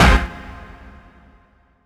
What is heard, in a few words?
Dance Mega Synth; Best Service; 90 s Sample CDs